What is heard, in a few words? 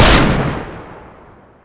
nightrevolver shoot; tf2classic